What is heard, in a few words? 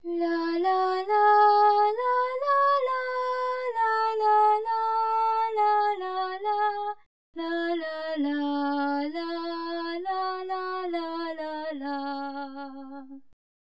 humming, voices